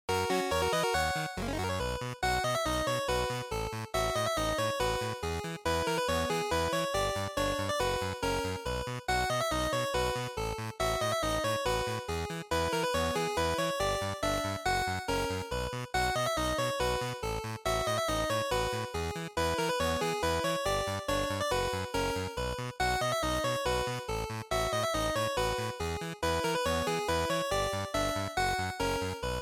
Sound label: RPG Victory, bgm